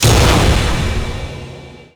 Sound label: shield detonate; weapons; dota; abaddon